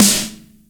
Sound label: crunchy snare one shot a key; snare-drum-samples